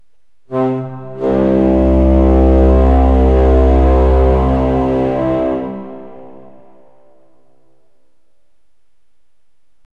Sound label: a deep ancient horn sound; wind instrument